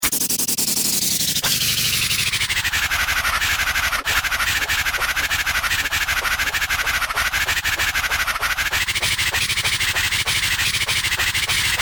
flight of the bumblebee breathing noises (from my own mouth)